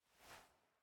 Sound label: Click Record; game audio; Mita; MiSide